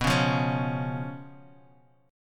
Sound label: B Suspended 2nd Flat 5th B